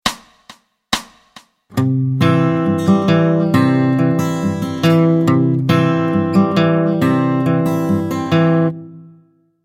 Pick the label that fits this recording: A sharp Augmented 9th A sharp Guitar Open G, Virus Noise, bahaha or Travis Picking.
Travis Picking